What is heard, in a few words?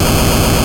move, ATARI